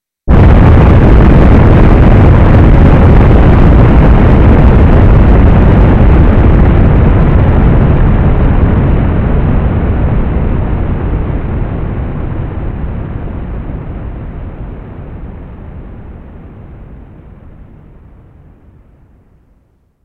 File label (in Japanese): gakekuzure; 崖崩れ; 効果音素材